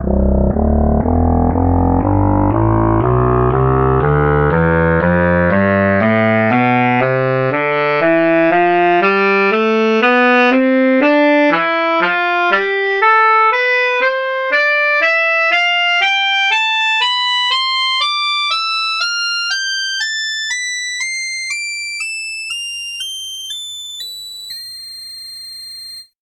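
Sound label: tenor saxophone tonleiter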